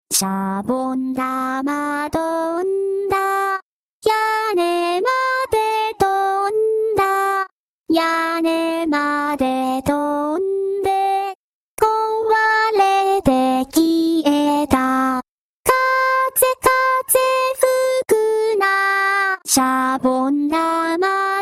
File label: punch